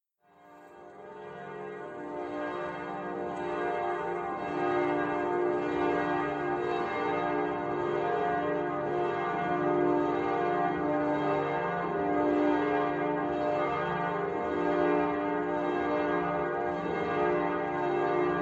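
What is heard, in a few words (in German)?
Glockengeläut der Christuskirche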